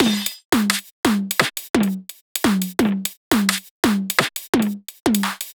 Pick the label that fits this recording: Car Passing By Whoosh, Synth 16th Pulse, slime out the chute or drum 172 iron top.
drum 172 iron top